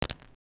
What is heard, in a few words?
action